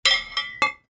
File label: Dragging; game inn